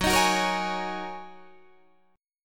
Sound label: Gb 6th Add 9th Gb down Guitar Open D